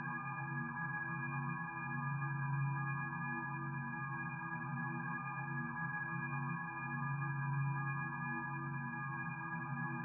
Drone shrill erie waivering whistles with background pulsing hum